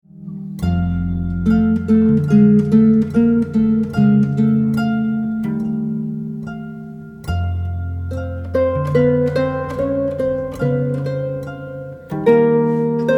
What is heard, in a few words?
New Strings